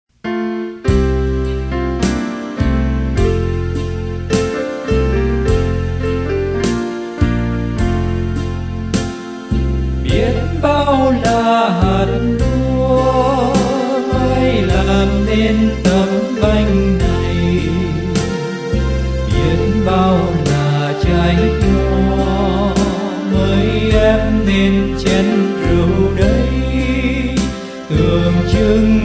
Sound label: alto